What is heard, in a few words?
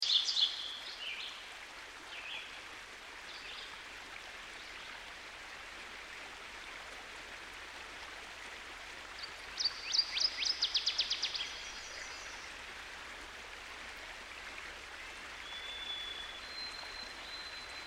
Northern Waterthrush; Dan Gibson; Songbirds By The Stream